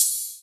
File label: Rides, Cymbals